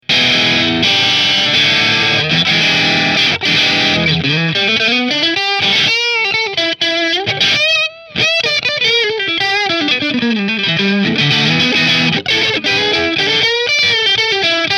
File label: Position 1 Marshall; Italia